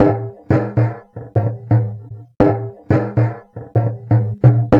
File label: Kadu Drum, Acoustic Beats; Sonic Foundry (Sony Creative Software); Myths of Technology